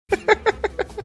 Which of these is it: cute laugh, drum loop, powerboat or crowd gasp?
cute laugh